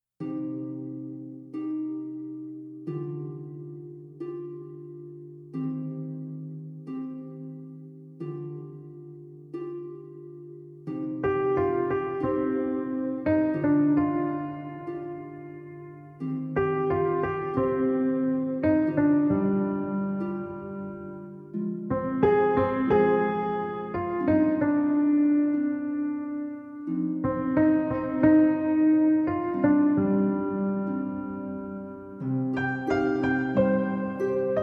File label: no strings